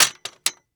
Technology Electronic Tripod Light Tripod Setting Down On Concrete